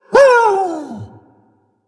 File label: Undead Beast Emote Trigger Defrost